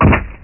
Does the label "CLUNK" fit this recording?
Yes